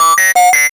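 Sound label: SYN-FX, synth; E-Lab; X Static Goldmine 1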